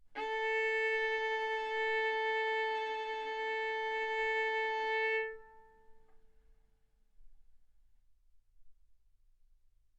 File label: Violin arco A4 B4; mf; sul A; stereo